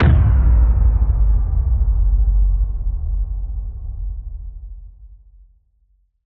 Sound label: Impact; impact samples